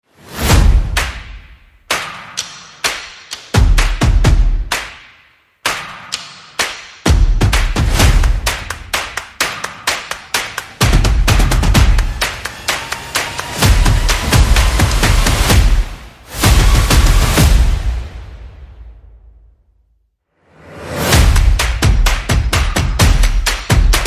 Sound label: No Bass